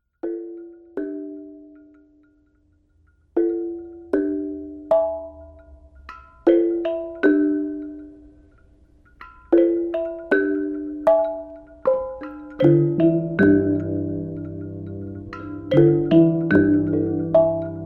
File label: Two Bonangs, Coated Spheres, Piano, Two Synthesizers, Natural Objects